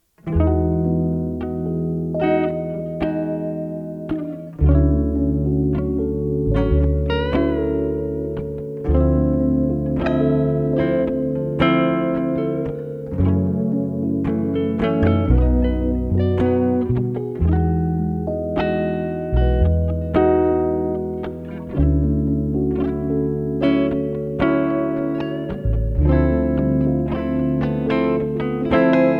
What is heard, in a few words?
electric Food Sketch Instrumental